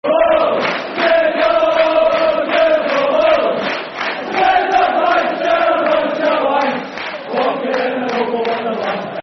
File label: Football Chants; One Nacho Novo; Rangers GRFC